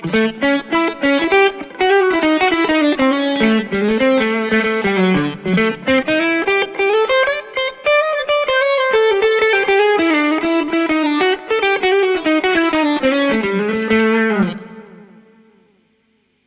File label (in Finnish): kitarasyna; guitarsynth